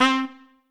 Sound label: Trumpet melo